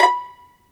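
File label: PIZZ VLN F#4, violin; AKAI S-Series CD-ROM Sound Library VOL-2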